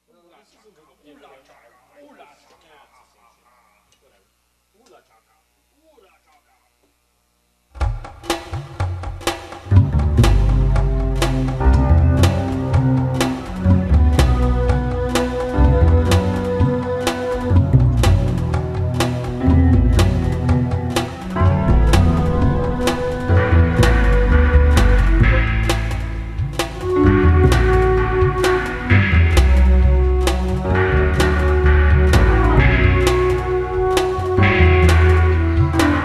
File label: Rainy